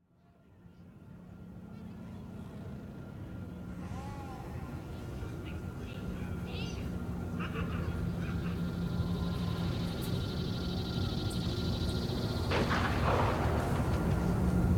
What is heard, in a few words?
amb market; ambiance; environment track; tatooine